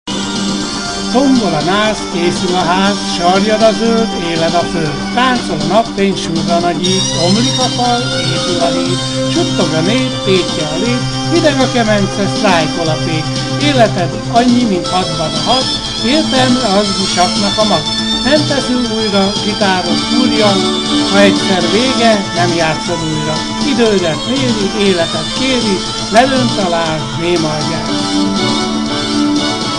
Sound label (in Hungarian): ritmus